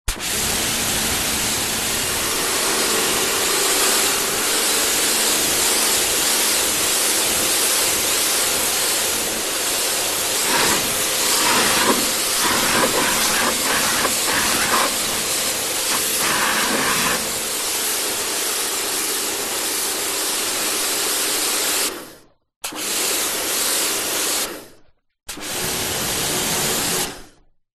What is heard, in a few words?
industrial pressure washer kartcher k2 on run various close to nozzle; zapsplat